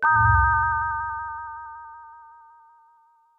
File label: SYNTH Pure Tones Chordz